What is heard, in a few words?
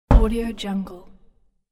snowball hitting window from indoor; domestic sounds